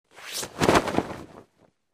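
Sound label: sounds of waffle towel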